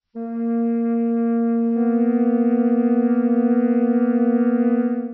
flöte b1 h1; Intervalle, die man durch Potenzen von 2 und 3 darstellen kann

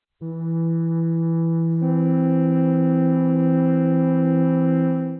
flöte e1 c2; Intervalle, die man durch Potenzen von 2 und 3 darstellen kann

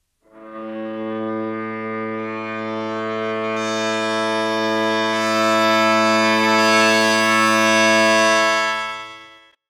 natur; 5. Teilton der Obertonreihe berücksichtigt (F, 2F, 3F, 4F, 5F); Naturtonreihe

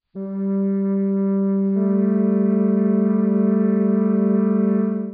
flöte g1 a1; Intervalle, die man durch Potenzen von 2 und 3 darstellen kann

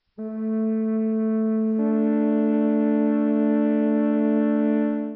flöte a1 d2; Intervalle, die man durch Potenzen von 2 und 3 darstellen kann

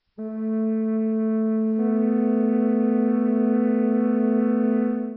flöte a1 h1; Intervalle, die man durch Potenzen von 2 und 3 darstellen kann

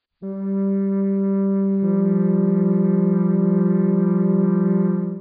flöte g1 f1; Intervalle, die man durch Potenzen von 2 und 3 darstellen kann

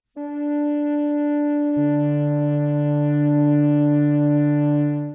flöte d2 d2; Intervalle, die man durch Potenzen von 2 und 3 darstellen kann

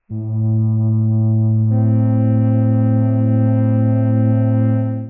flöte a c2; Intervalle, die man durch Potenzen von 2 und 3 darstellen kann

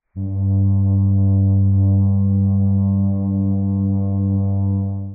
flöte g g; Intervalle, die man durch Potenzen von 2 und 3 darstellen kann

